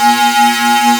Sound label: DISOBELL A3, Prophet Five; E-MU Formula 4000 Series Vol. 3; Analog Odyssey